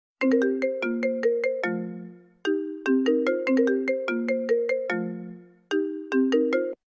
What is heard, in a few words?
iPhone Call Ringtone